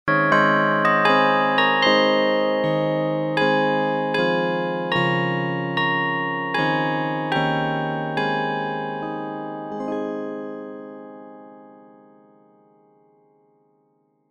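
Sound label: Battle Hymn Of The Republic, bells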